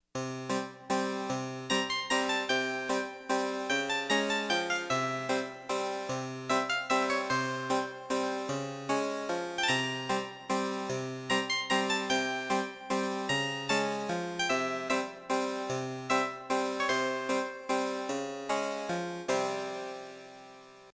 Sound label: harpischord; ultima